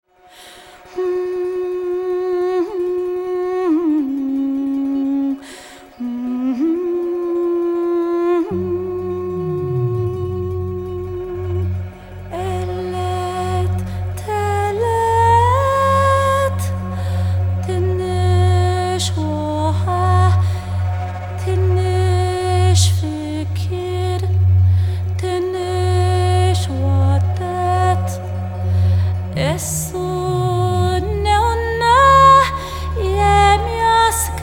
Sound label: Unpolished Ethiopian Lullaby